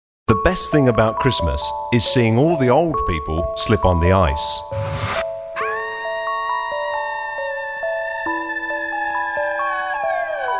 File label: Christmas Jingles